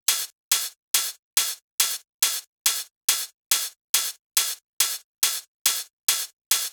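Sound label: drum Loop 140BPM overhead; Vengeance - Essential Clubsounds Vol.5; VEC5 Drumloops; VEC5 Multi Loops 140BPM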